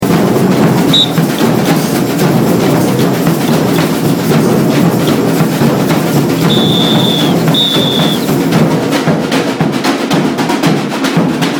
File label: Swing Do Pelo Break 3